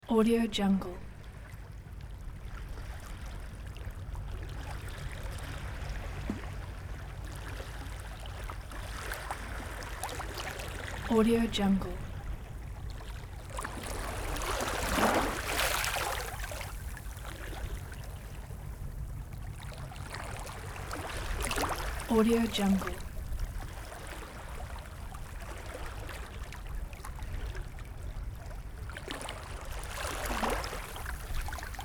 ocean beach sea waves calm with ship in distance

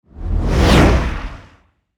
whoosh soft fast sparkle; transitions and movement

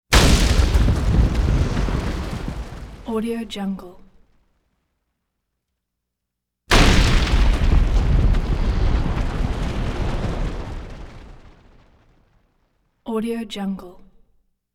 earthquake explosion; انفجار زلزله

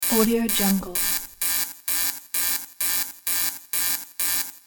space station warning alarm; industrial sounds

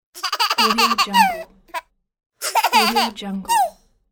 baby laugh; human sounds; خنده ی بچه

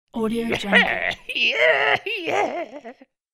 psycho male laugh; خنده مرد روانی; human sounds

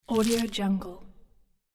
celery twist fast; domestic sounds

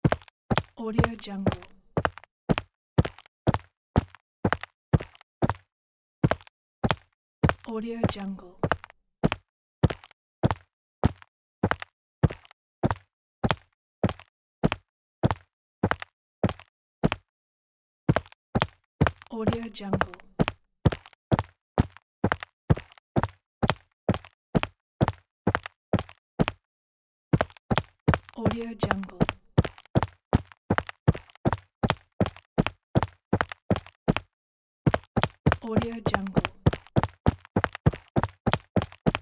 footsteps wood

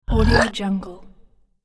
zombie dog

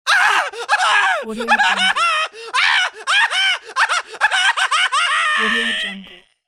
terrified screams; human sounds

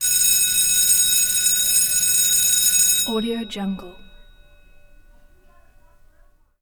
bells school bell school ring voices distant; زنگ آخر مدرسه

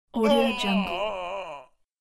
low male kill moan; ناله مرد بعد از چاقو

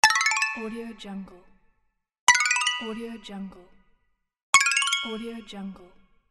basic prize; game

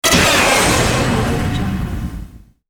piercing gunshot effect with pitch descent; بازتاب شلیک به زمین

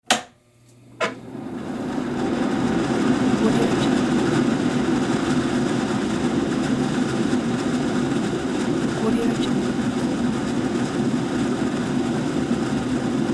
bathroom exhaust fan; domestic sounds

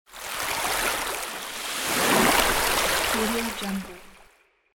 water reveal effect; transitions and movement; موج دریا

